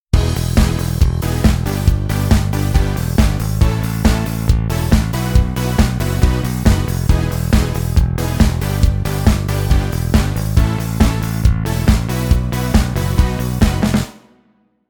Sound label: layered sound song nonlayered